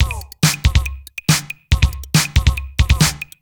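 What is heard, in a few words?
DRUMLOOP ELECTRO 140; Techno2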